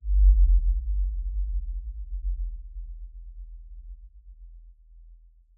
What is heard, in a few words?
amb cavern excavation lfe; ambient; Half Life Alyx